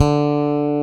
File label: tite bass D4; Drums In Your FACE!